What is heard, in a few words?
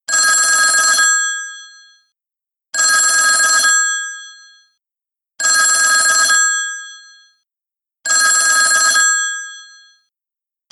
telephone